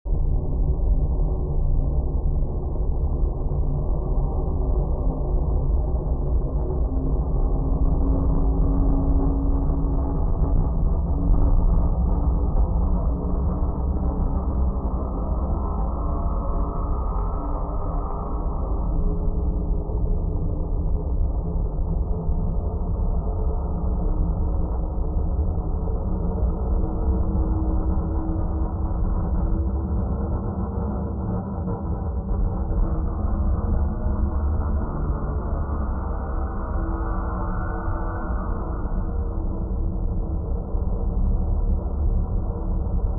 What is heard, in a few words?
noise journey alien landing; Atmospherics